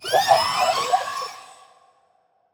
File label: BABYMOLOCH damage